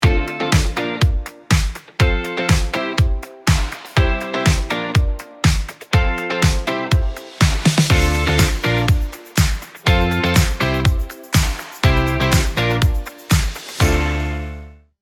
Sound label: music